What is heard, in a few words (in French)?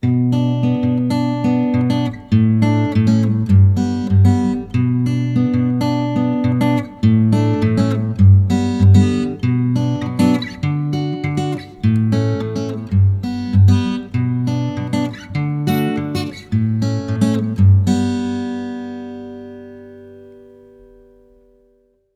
jeux aux doigts; parker pa22